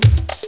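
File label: disappear; Amiga Games Extra 1996 #6; harrysballoons; sonix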